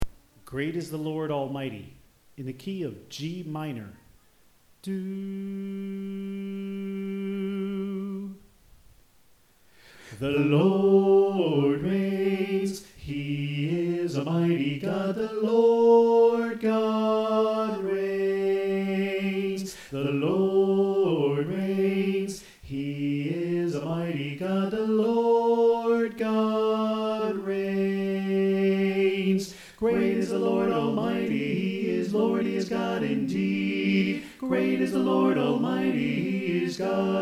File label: Tenor Left